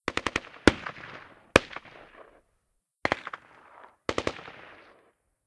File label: idle human; cstrike